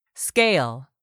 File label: SCALE